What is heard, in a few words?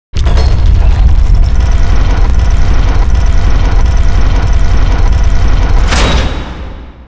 DOOR DIE